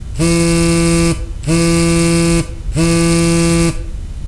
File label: vibration